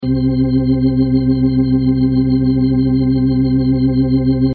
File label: organ Meter Loop; wave interference; waves intro